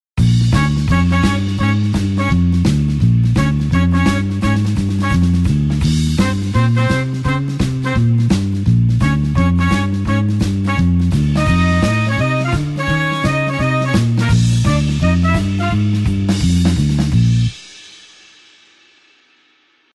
blues horns up Bb

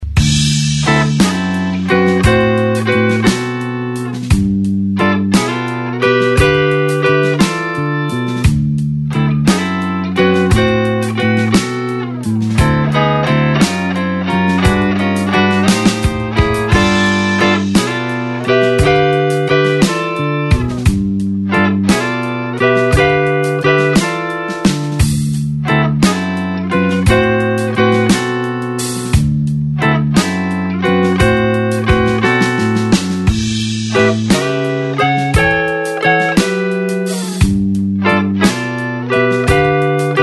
blues slow backing up

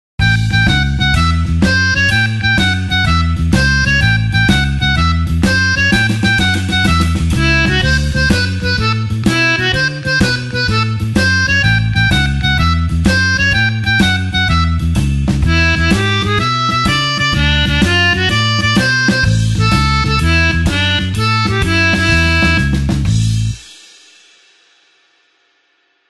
blues harp shuffle A

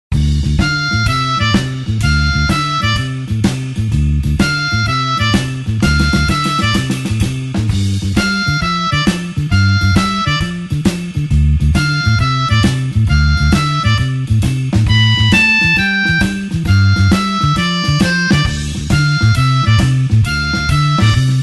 blues harp shuffle D

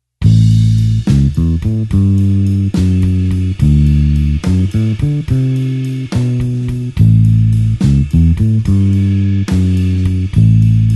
blues bassline slow A

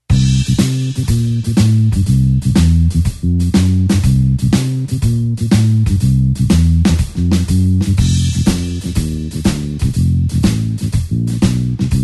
blues bassline shuffle C

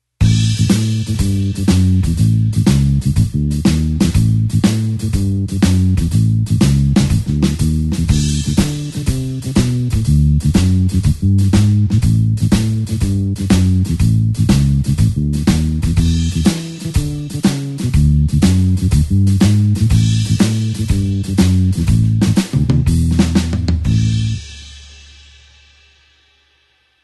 blues bassline shuffle A

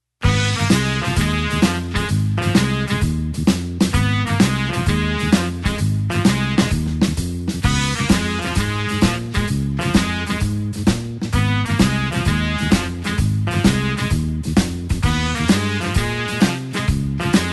blues horns shuffle Ab

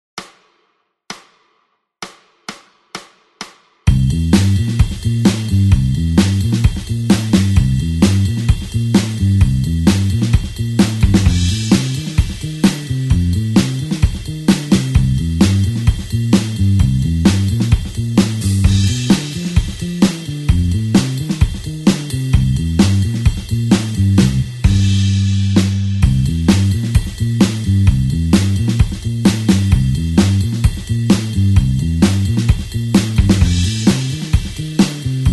blues 8beat basic pattern C back

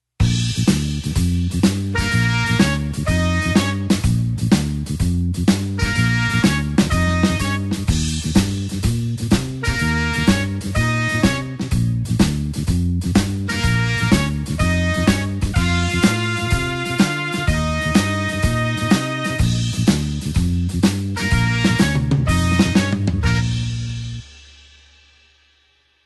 blues horns shuffle Bb

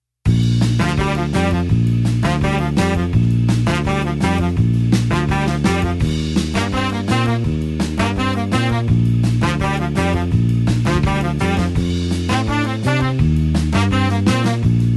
blues horns 8beat Bb

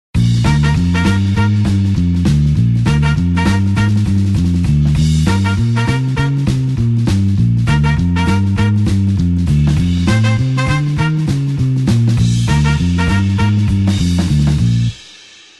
blues horns up Bb

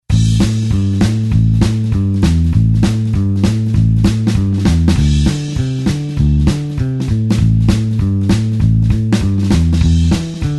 blues bassline 2beat A

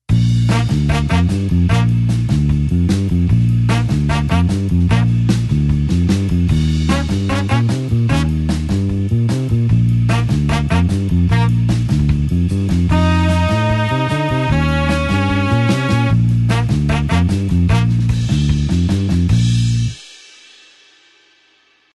blues horns 8beat Bb tempo

